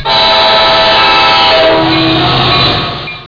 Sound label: train